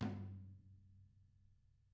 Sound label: TAMA TOM HIT; ILIO; Double Platinum Drums 1